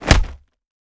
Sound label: punch wall; gmod; arccw uc commons attachments